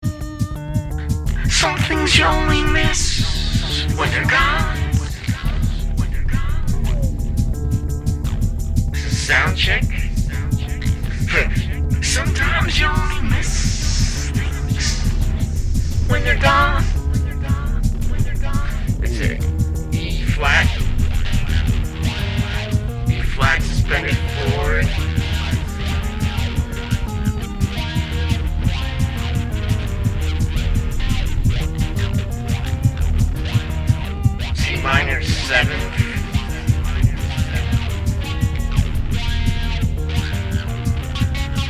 Soundcheck

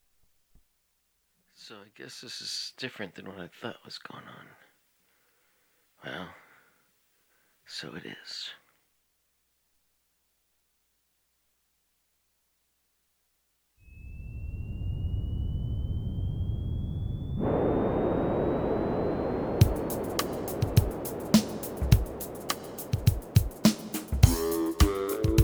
Instrumental

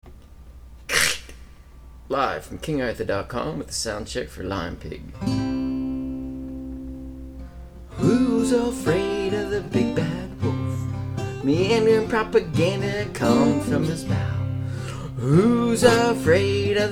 soundcheck

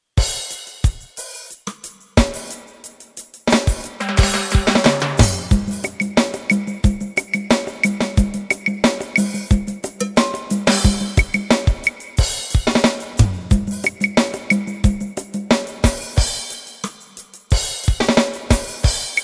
Reggae Sway intro

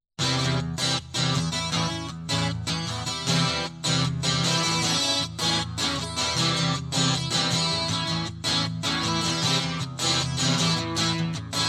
Guitar Loop

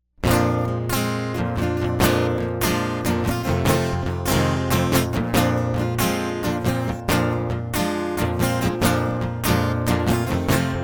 acoustic

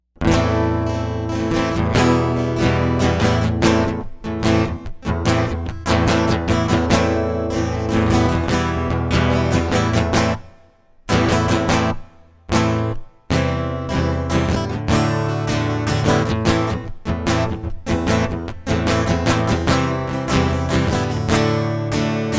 acoustic

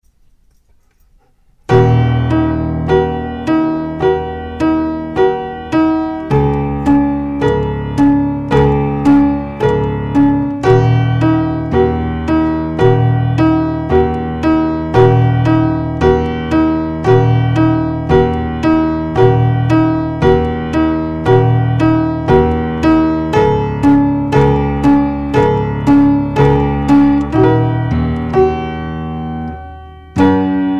Piano Concerto

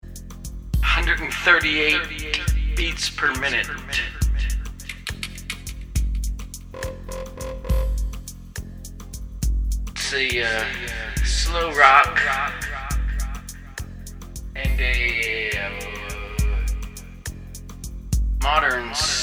Liquid Id Soundcheck

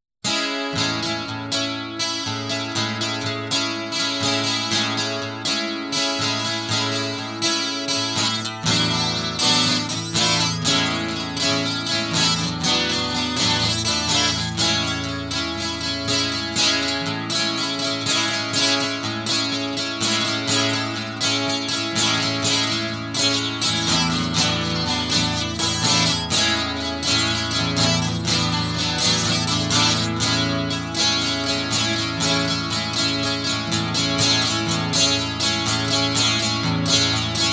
Guitar Prelude

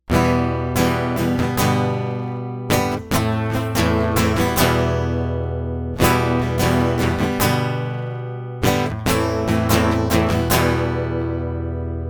acoustic

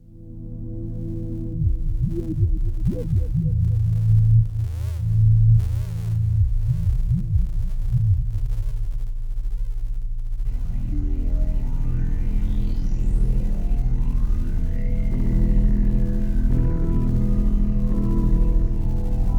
space bass

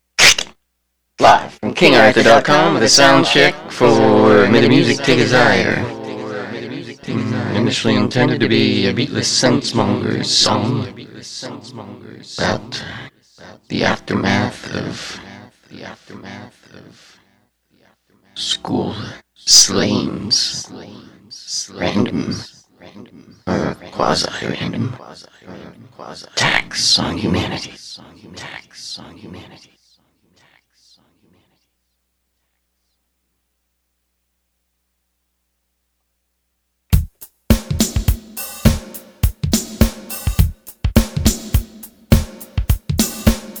Soundcheck

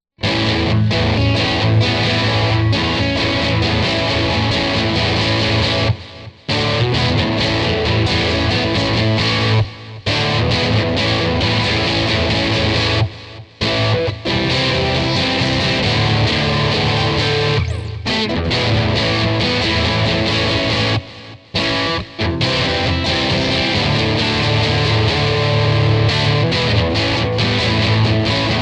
electric

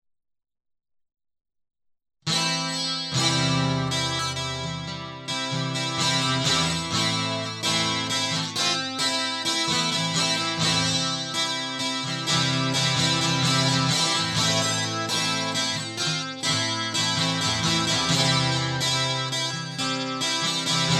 Guitar Loop

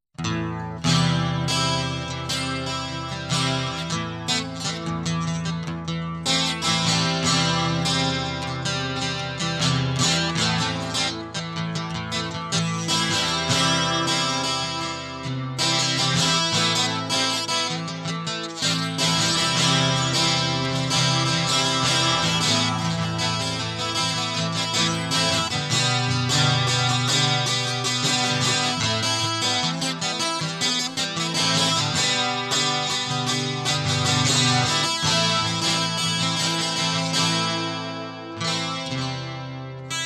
Guitar Loop

